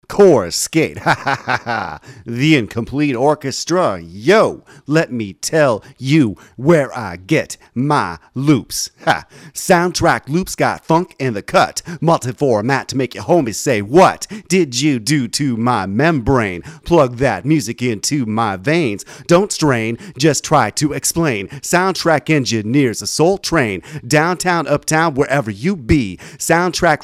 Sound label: Sound Track Loops 100 BPM